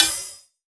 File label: Eucalyptus Ride, Dubstep Cymbals; One-Shots; Drums; Ghosthack; Ghosthack - AC2024 - Day 13 - Dubstep Sounds 2025